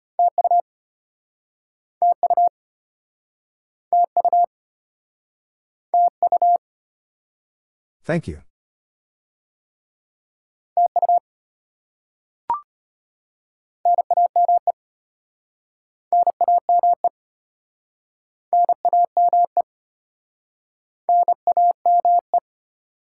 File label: Top 20 QSO Elements - 25wpm, morse code practice